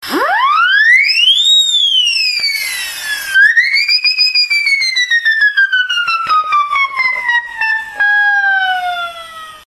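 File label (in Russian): комедийный свист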